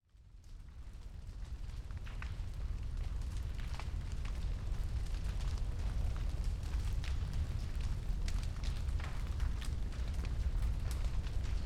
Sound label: Forest Fire